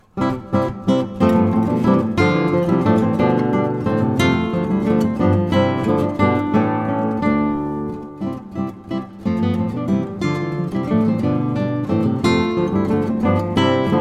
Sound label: Basse Dance; No.6 Les Grands Douleurs; Tielman Susato